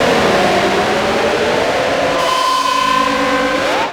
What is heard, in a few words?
Kaos FX, Ambient Grooves